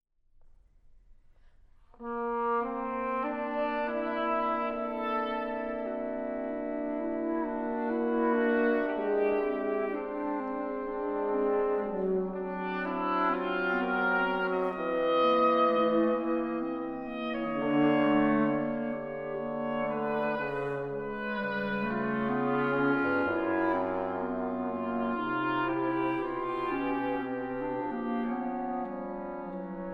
Quintet for Winds

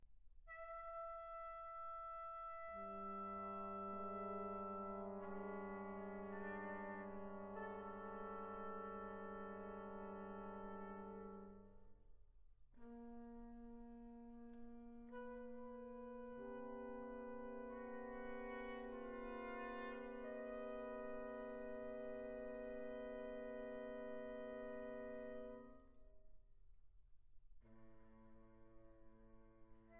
Triptych for Brass Quintet, Sostenuto; Elegy; in Memory of Anton Webern

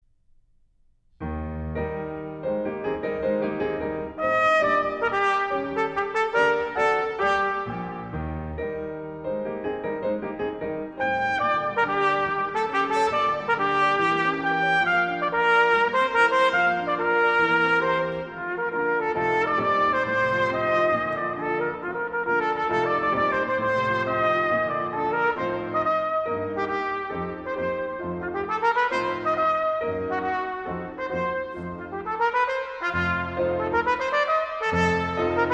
Sonata for Cornet and Piano, Allegro con brio

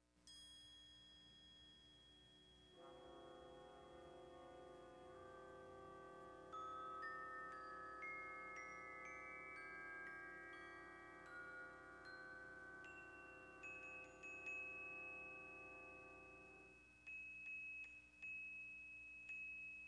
Concertare For Brass Quintet

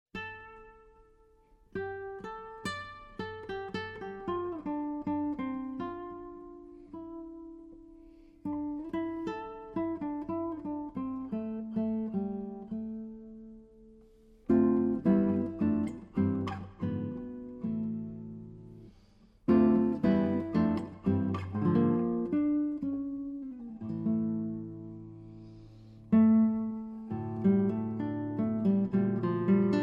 Guitar; Castelnuovo-Tedesco; 24 Caprichos De Goya

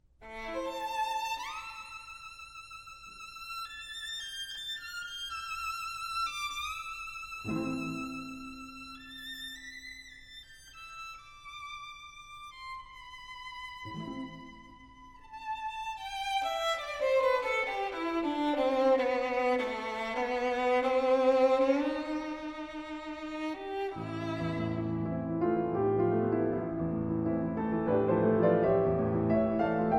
Piano Quartet in A Minor, Andante

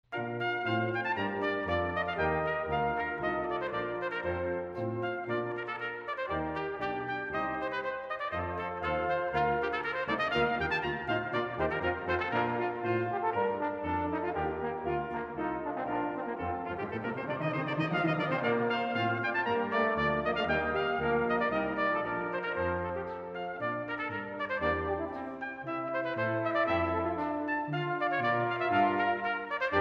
Concerto in B Flat Major, Allegro, Brass Quintet; Atlantic Brass Quintet; A Musical Voyage

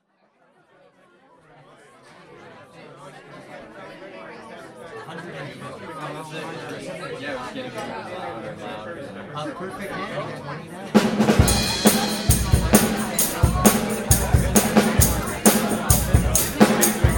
Motown Jingle Bells

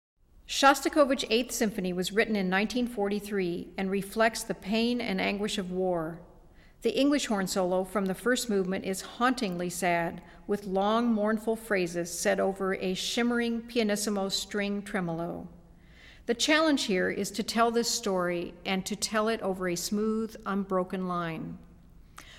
Symphony In C Minor, English Horn; Orchestra Pro Series - English Horn

